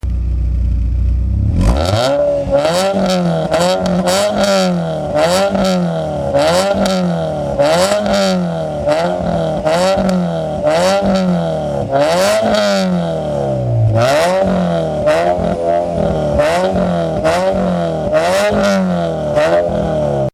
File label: blip